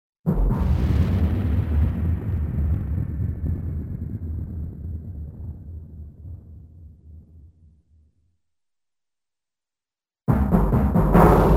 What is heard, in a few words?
Timpani Drum Hits & Long Rolling Explosion, Cartoon; Hollywood Edge; Cartoon Trax